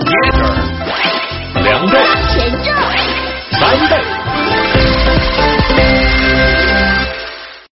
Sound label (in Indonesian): nada notifikasi mahjong